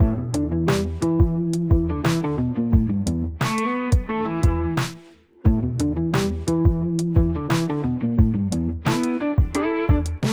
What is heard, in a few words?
instrumental